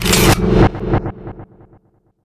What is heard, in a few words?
bonus alert end; Counter-Strike Global Offensive